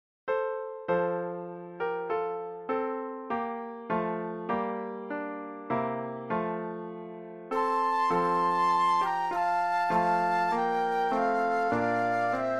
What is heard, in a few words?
Flute Duet and Piano; christmas